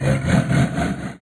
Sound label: dagon hurt